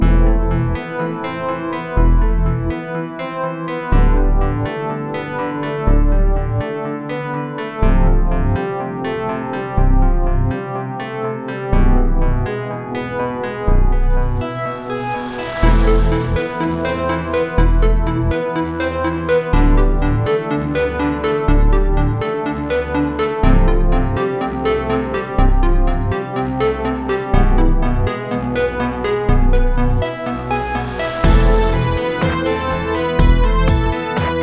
Simple music track with chiptune / 8-bit / retro vibes; looped; Tomasz Kucza